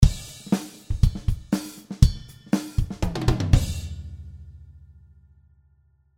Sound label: drm test